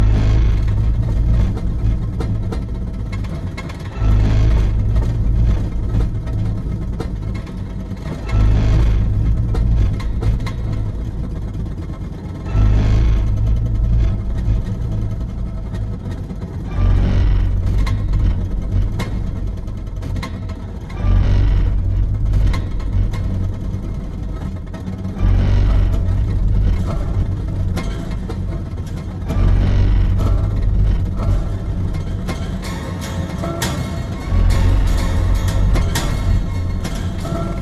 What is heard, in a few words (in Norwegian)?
hjemmebyggede instrument lyd; hjemmebyggede instrumenter